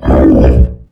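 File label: commando voice hurt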